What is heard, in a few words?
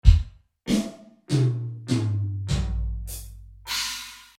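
Drum room; Wohnzimmer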